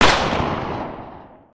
Pistol Monkey Shoot; gnarled forest

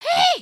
come here, emotes

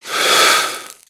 Fire Extinguish

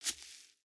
Foot Grass Walk, footsteps

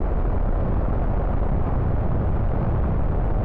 boost loop, vehicles; aronwk collection; ndaudio

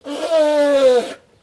elephant

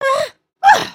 backflip cautious; emotes

